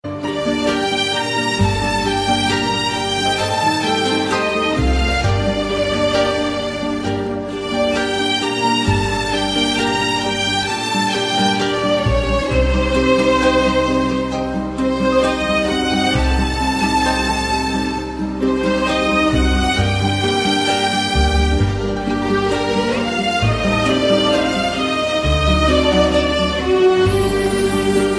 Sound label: Instrumental